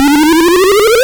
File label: Power Up, Sound effect of Power-Up; SML